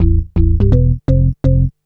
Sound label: bass 125